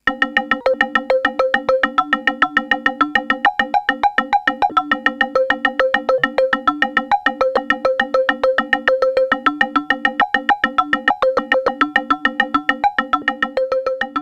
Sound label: Mini Korg 700S Sequence